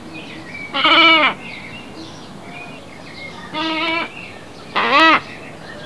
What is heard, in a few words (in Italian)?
Mignattaio